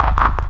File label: noise, FX & ATMO, ALARMS & ALERTS; Techno Trance Essentials